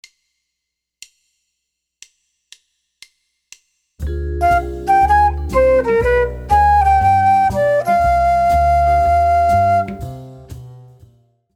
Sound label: c minor 2 5 1 c